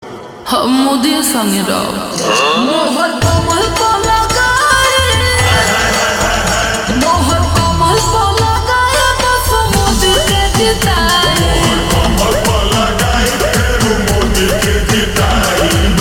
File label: Ringtone Slowed Reverb